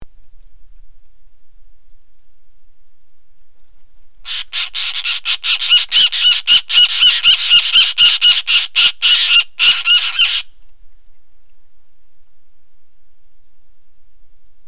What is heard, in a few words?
Elanus Scriptus; Letter-Winged Kite; Call